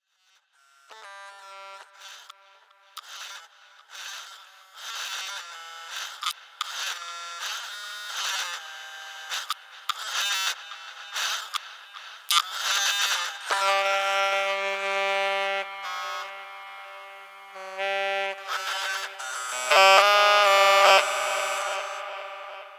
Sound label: Vox; The Weird Side Samples; 99Sounds